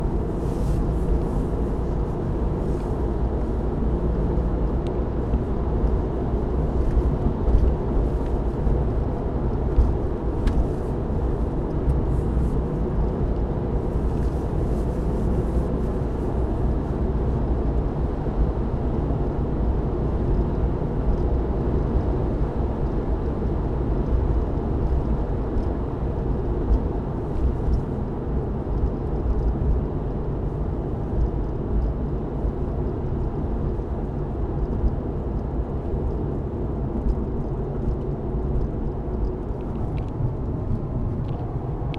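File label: Interior Porsche 911 Driving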